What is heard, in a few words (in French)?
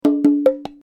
bongo son